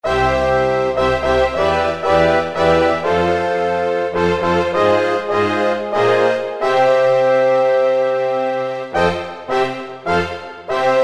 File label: Tutti Str&Brs, Kurzweil K2000 Orchestral